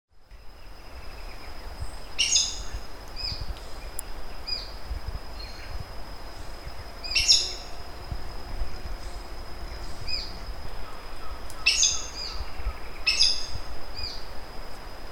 Pteroglossus aracari Araçari de bico branco

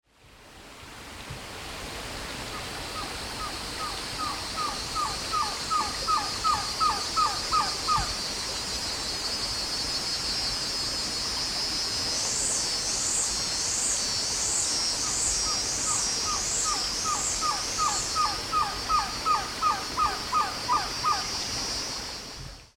Trogon viridis Surucuá de barriga amarela

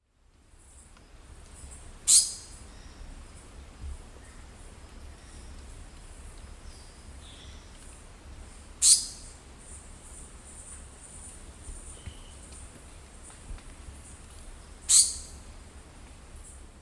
Conopophaga melanops Cuspidor de máscara preta

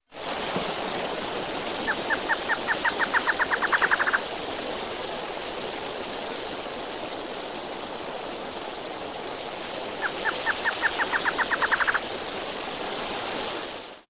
Thamnophilus ambiguus Choca de sooretama

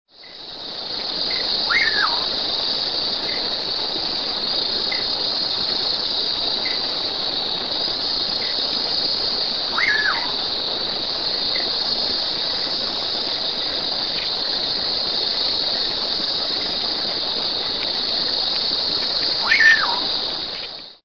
Hydropsalis albicollis Bacurau

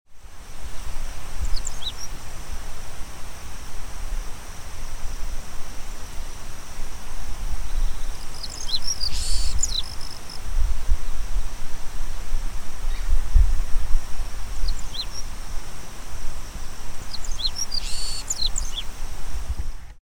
Anthus hellmayri Caminheiro de barriga acanelada

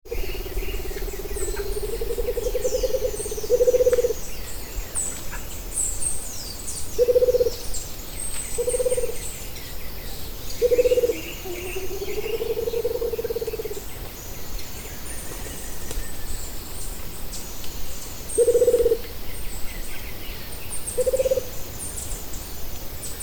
Baryphthengus ruficapillus Juruva

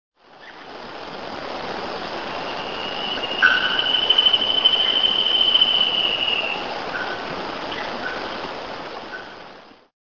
Hypoedaleus guttatus Chocão carijó

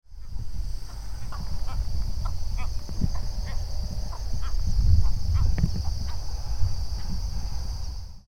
Bubulcus ibis Garça vaqueira

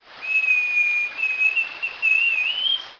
Schiffornis turdina Flautim marrom

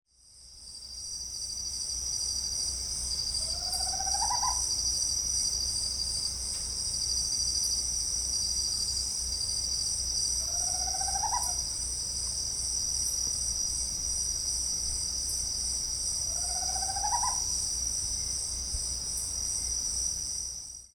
Megascops choliba Corujinha do mato

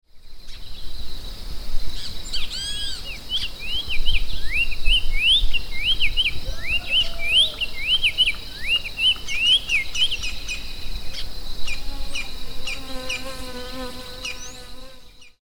Schistochlamys ruficapillus Bico de veludo

